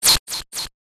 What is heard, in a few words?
sound design glitch lo fi